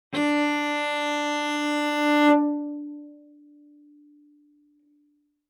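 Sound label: Cello arco fortissimo sul A D4; MIS Pitches - 2014; Strings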